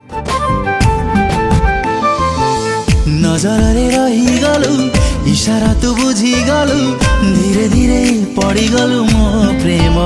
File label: ROMANTIC